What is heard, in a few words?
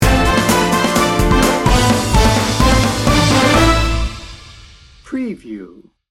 adventure junkie sting bumper